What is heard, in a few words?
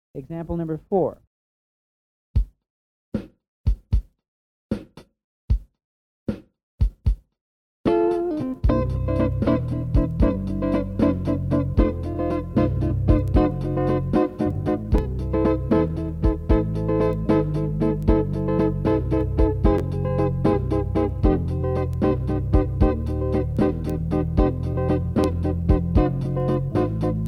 Slow Blues In C